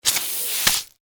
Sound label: Science Fiction Spider Web Shoot Splat Long Super Power; Aliens; SFX Bible